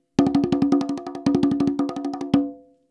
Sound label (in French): frise, congas